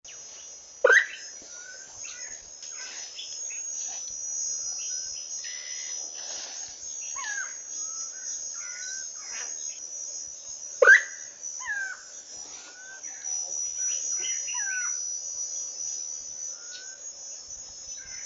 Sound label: pitta angolensis; kurtaczek angolski